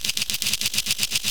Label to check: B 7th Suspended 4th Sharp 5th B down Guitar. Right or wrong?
Wrong